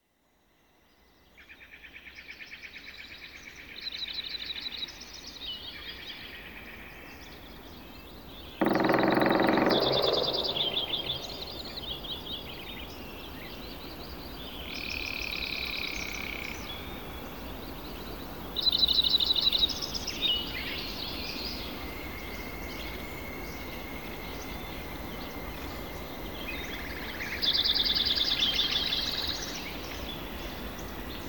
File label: Sierras Ambiance